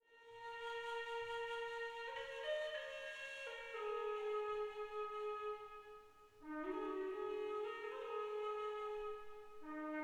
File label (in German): D moll Flöte Klangprobe